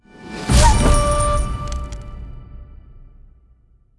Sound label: chest Open; netent